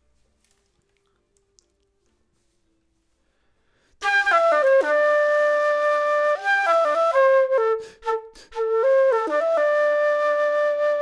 flute; logic

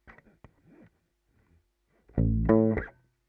Hi-Fi DI

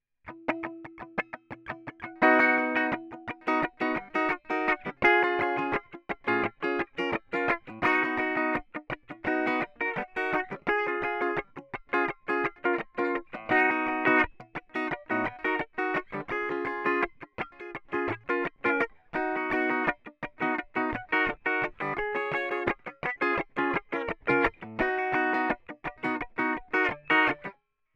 plucking; logic

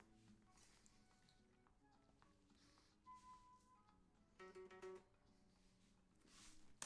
flute intro; logic

